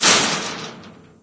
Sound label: chainlink, footsteps, infected, walk